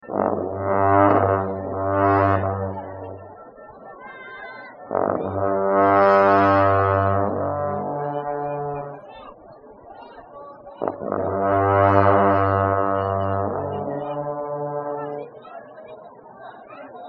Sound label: Losar Monks Horns